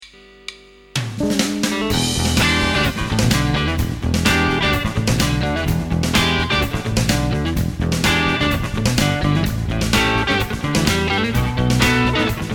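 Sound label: Strat Overdrive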